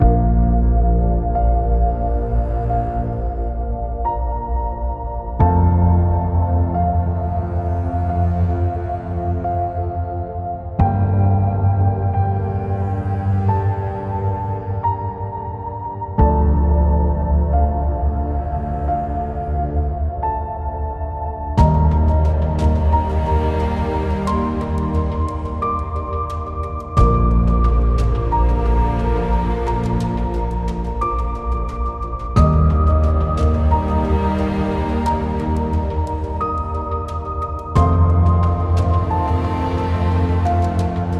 For Documentary

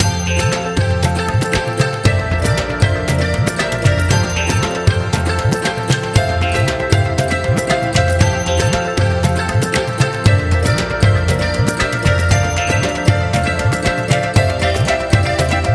India Background

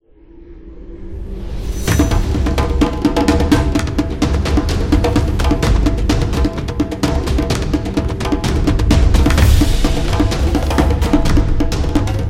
Africa Drums

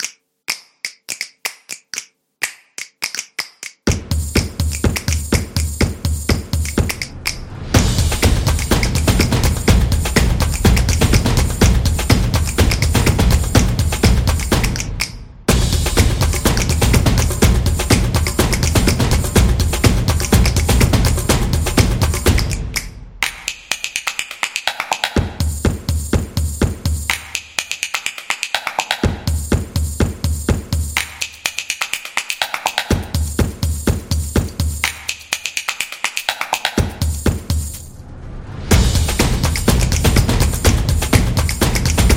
Upbeat Percussion; snaps and claps

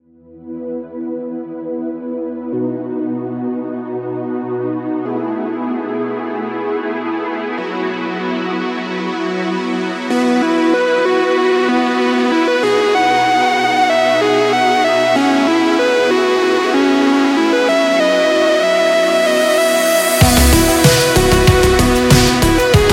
Neon Wave, synthwave

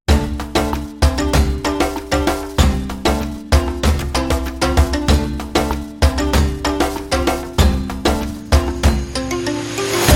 Shaman Jungle, African Tribe Music

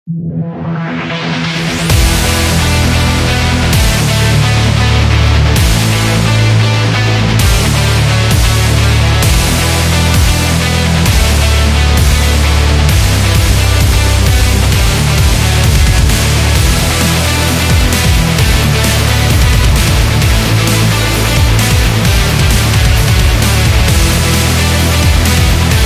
Ride, metal

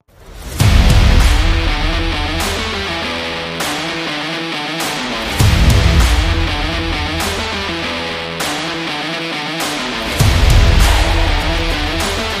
Driving Rock

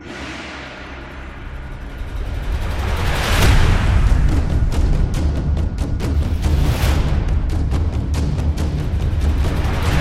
Taiko Drums Percussion

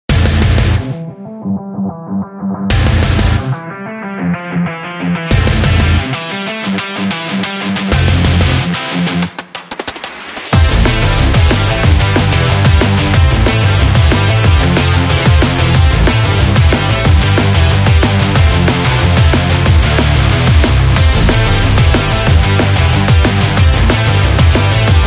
This Is Metal

